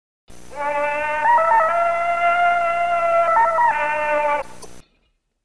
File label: Tarzan Yell